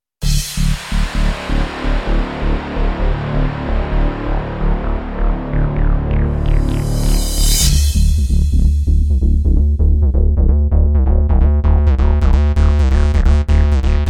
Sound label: Korg PA-80; Progress